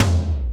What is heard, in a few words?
Toms